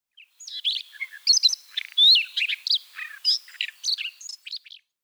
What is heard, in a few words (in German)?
Rubinkehlchen Luscinia calliope